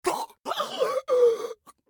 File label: scav death; eft